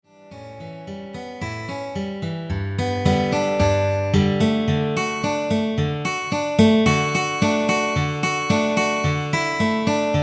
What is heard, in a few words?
rock lulla